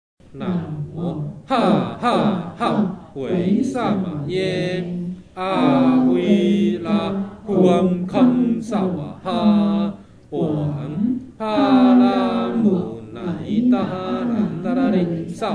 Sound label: Hahaha; 灭定业真言; 地藏菩萨圣诞